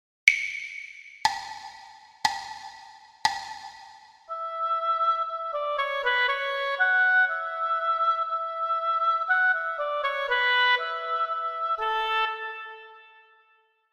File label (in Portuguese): percepcao ditado